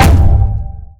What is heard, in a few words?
Larger Confirm